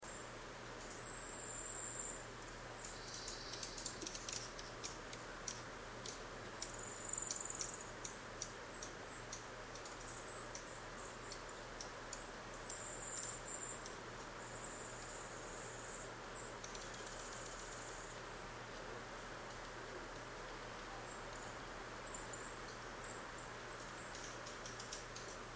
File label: dark-eyed junco